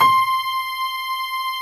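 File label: grand piano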